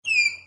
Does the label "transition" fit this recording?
No